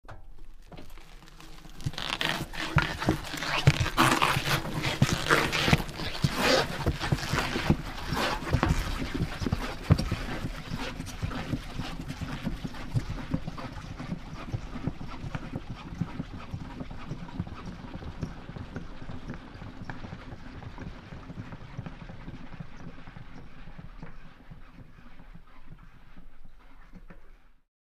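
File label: Car Tire Flat Push Away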